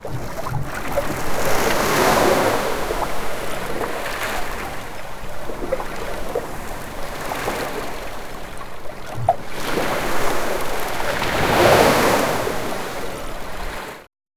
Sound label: ocean waves gently enter